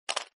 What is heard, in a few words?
roll show, backgammon